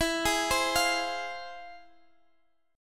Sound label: F Minor Major 7th, chord